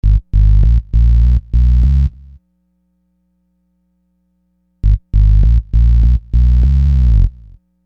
Hard Synth Bass; Construction Kit; HIP-HOP; Sonic Foundry (Sony Creative Software)

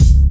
BD, Dance Mega Drums, HIP

